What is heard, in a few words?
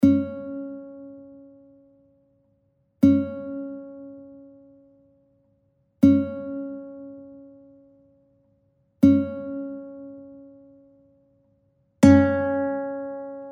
Bandurka A-strij C# 4; 2-га струна – до#, C#; 1-ша струна – мі, E